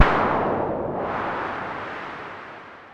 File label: Synare 3, Filtered Noise